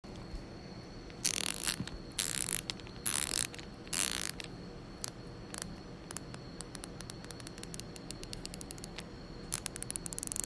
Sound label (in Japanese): ラチェット音